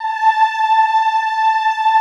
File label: OHH A4, Classical Choir; Best Service; Extended Classical Choir